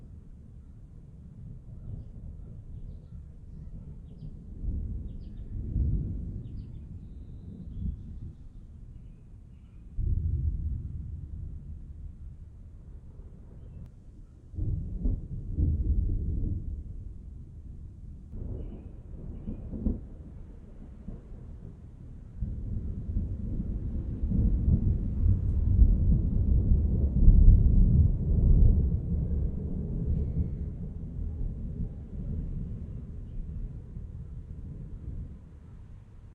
thunder

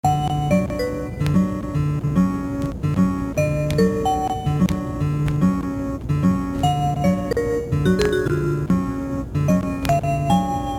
Elevator; OneShot Fading Memory

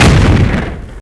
explosion; Rabbit-Wars

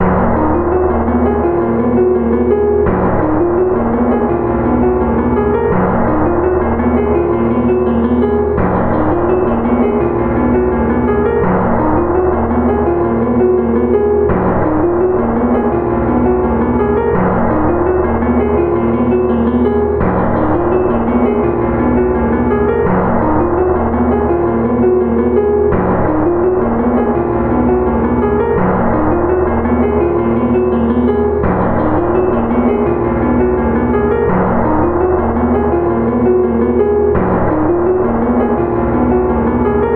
Collapse loop; OneShot Fading Memory

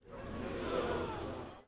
crowd ball wait short

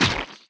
pumpkin smash

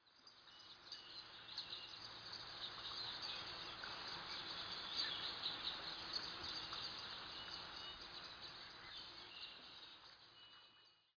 birds

